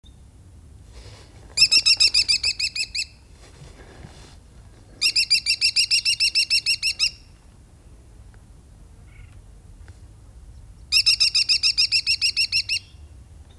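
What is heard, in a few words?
female kestrel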